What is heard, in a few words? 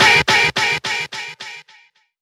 blow up